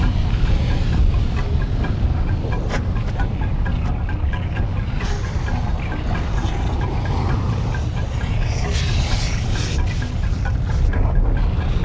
In the Heart of the Volcano (with volcano ambience); Tintin Destination Adventure